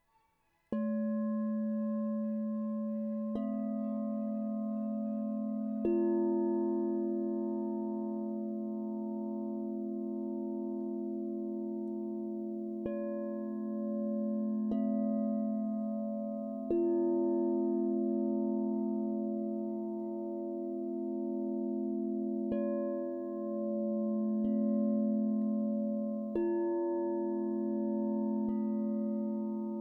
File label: LOW A C F, crystal bowl